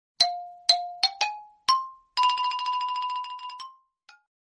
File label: notification